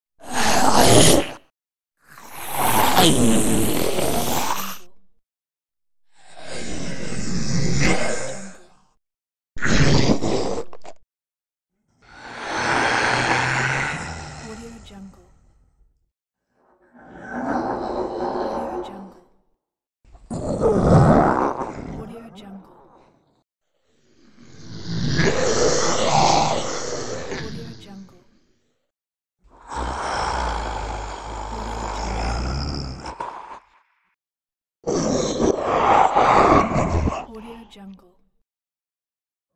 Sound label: zombie and monster voice